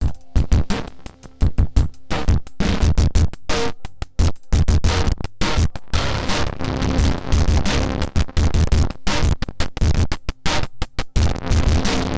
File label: Erica Fusion Plasma Drive Drums Dry Wet 50 Voltage Sweep